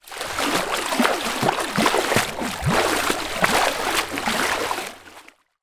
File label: animals shark splash surface water feeding